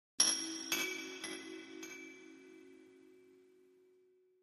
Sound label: Chinese Chimes Bells; mallets